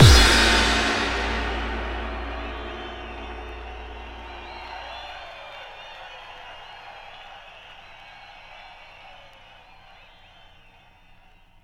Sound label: bassdrum reverb FX kick; Vengeance Samples